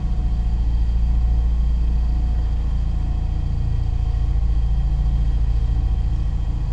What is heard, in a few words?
amb cargo bay; Star Wars Obi Wan; XBOX